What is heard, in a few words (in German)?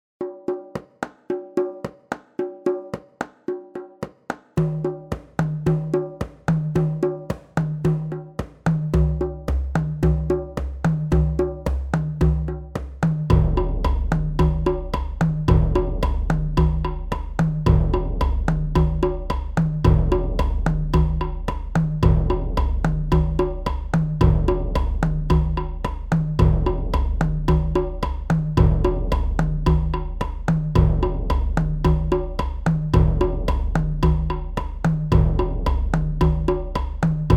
zauber abc perc pb